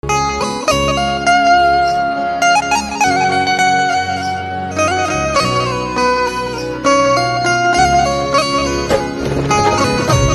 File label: Banjo Insrumental Ringtone